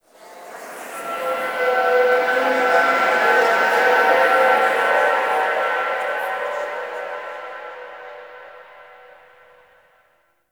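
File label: Zariman Amphitheatre Echoes, Ambience; Warframe; TheNewWar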